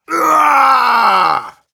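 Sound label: Engineer pain critical death, ru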